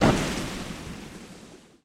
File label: Hand Glitch Back; Game rip; MiSide